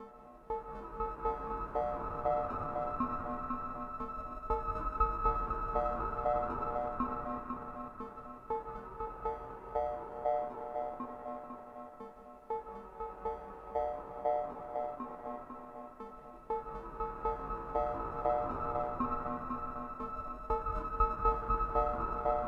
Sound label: scoring pack arp texture